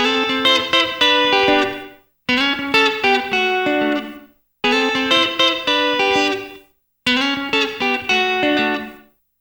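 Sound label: TOWNSHIP, S. AFR. GTR; Spectrasonics; Heart of Africa 2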